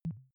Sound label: click bottom short, signale